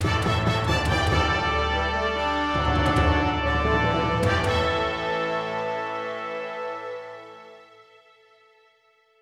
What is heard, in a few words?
Sting; Snd Red Fountain Sting